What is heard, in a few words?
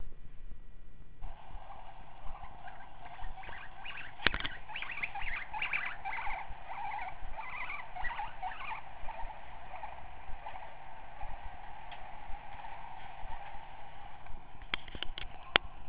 annoyed, guinea pig